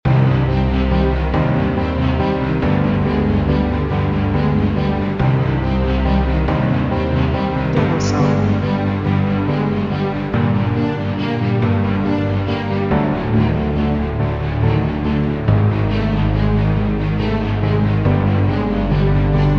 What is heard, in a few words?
Threatening Path of Sorrow Loop